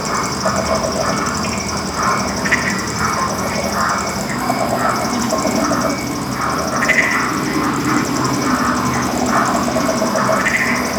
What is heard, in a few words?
Forest Exterior Night Marsh Amb; Warframe; Ambience; Grineer Forest